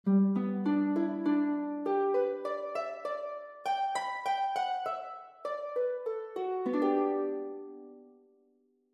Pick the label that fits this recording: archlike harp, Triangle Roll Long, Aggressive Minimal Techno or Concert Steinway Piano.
archlike harp